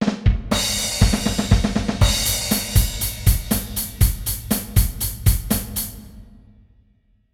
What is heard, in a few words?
intro fill